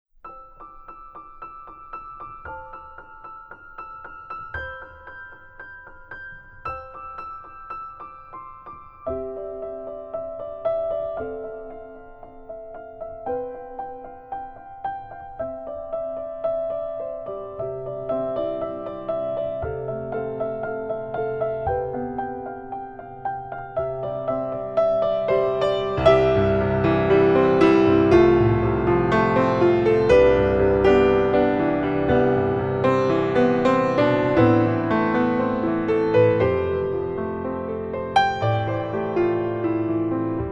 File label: piano intro